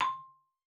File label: marimba C6; Xsample VOL-4